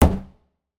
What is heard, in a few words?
empty barrel hit, percussion